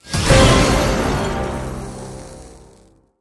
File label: win Line End Flash Large Win; jumanji